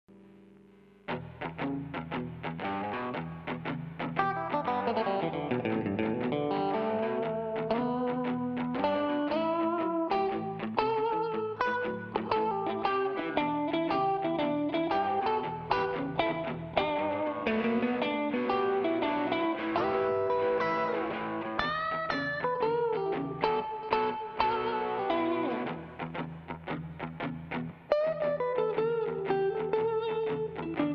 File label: strat